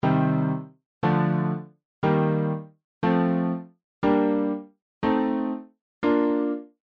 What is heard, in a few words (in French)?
Degrés en dorien